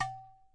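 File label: udu finger, Udu Clay Hand Drum; ETHNIC and WORLD PERCUSSION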